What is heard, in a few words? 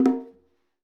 PERCUSSION; Essential Percussion